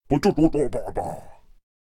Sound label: Attack Vocals; Zeus